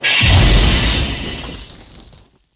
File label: glop grenade explode